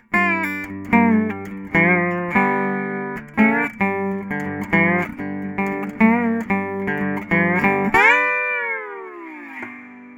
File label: playing dobro